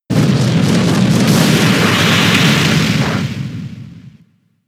Flame Charge; pokemon sfx gen 6 attack moves xy oras

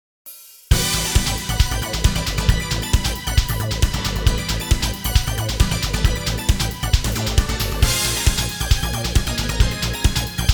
Goal; Scud Race

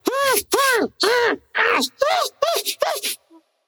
inmate death; Devour

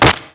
chest pop; gonner linux macos ps4 switch windows xbox one gamerip 2016

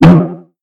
Trampoline

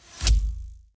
Ranger Hail Of Arrows Impact; Idle Champions of the Forgotten Realms

